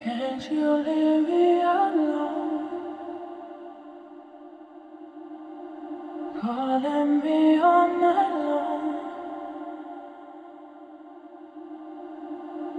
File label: Vocal Loop, 150 BPM C Min; Alone; Vocal Loops